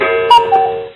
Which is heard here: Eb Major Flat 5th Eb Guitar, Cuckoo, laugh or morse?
Cuckoo